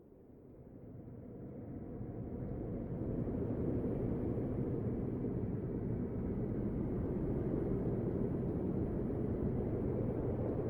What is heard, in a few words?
wind, Mines; AMB; OneShot Fading Memory